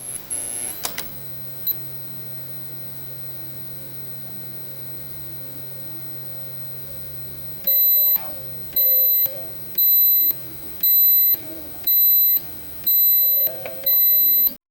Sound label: camera footage beep or cc, surveillance